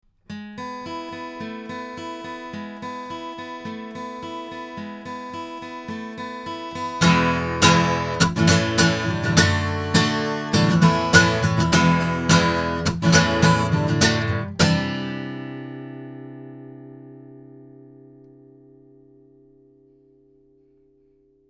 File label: ADK Hamburg guitar, microphones